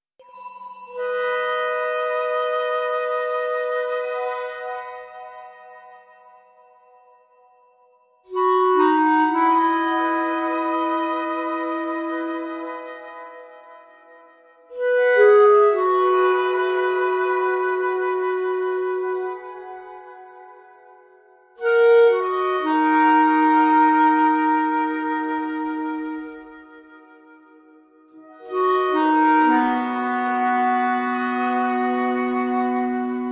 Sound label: Instrumental